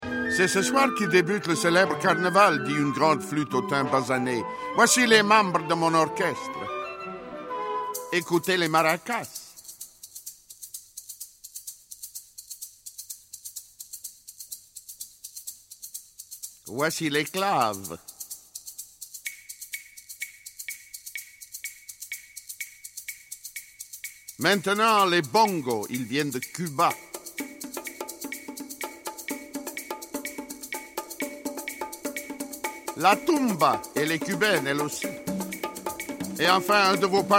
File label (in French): maracas; passeport piccolo; bresil cuba